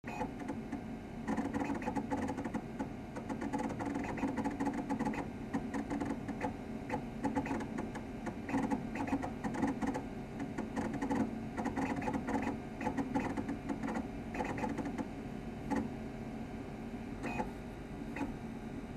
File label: Small Room Hard Drive